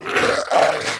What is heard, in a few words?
zombie death, monster